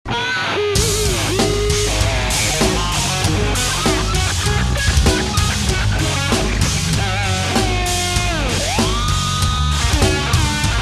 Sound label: solo